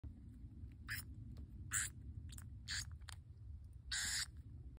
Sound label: Moles